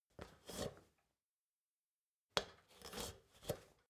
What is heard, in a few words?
step ladder metal scrape single